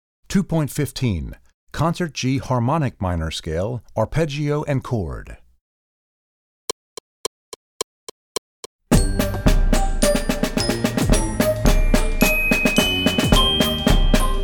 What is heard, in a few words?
Concert G Harmonic Minor Scale; Percussion